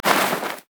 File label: sad mummy bandage toss; LoL; amumu